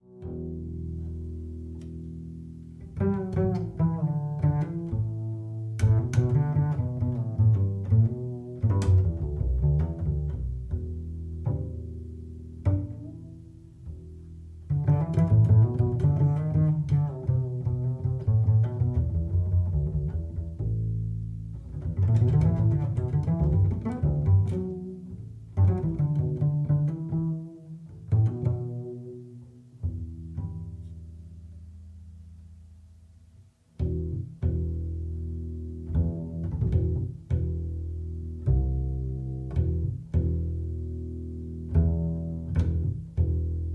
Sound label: spiritual jazz